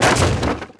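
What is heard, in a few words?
Wood Collapse Large; objects; Star Wars Obi-Wan; XBOX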